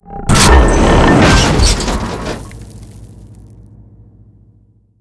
elevator car fall; hl2 ep2; ambient, levels, outland

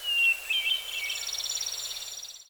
dist birds, forest; hl2 ep2; ambient, levels

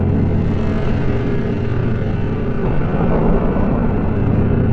citadel sickcore loop; hl2 ep1; ambient, levels, citadel

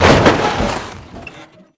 dog throw van impact; hl2 ep1; ambient, levels, intro

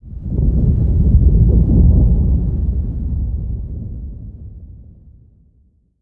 rhumble; hl2 ep1; ambient, levels, intro

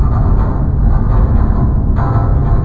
bin move loop; hl2 ep2; ambient, levels, outland

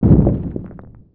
muffled concrete break; hl2 ep1; npc, antlion

